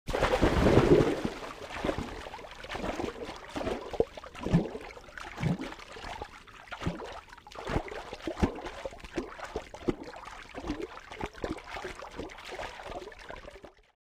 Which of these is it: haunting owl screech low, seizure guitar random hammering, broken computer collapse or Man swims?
Man swims